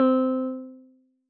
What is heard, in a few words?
Pluck Electric Guitar